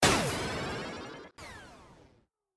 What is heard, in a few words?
radio stinger